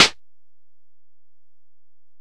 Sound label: Snare